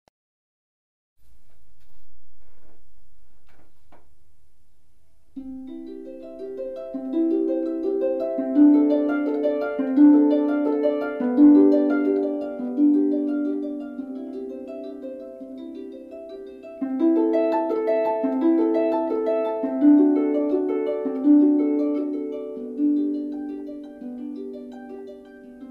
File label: Solo Harp Prelude in C Bach